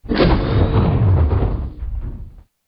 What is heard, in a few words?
Inner Open; SpaceshipDemo